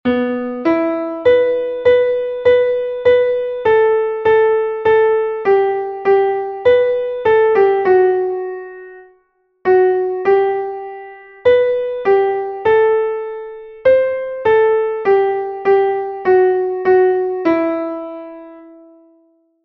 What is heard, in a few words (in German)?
o tannenbaum du traegst ein gruenen zweig klavier